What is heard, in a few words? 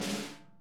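snare buzz; Sonic Reality; Interactive Drum Kits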